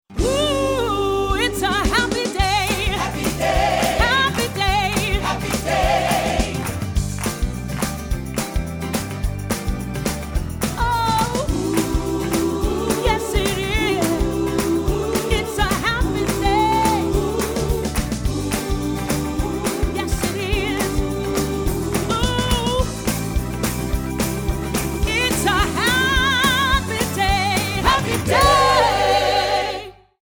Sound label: Jingle; HEB